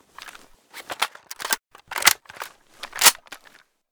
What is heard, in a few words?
sig550 reload empty, weapons